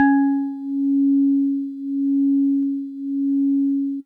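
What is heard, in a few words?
House Organ DX100 C#3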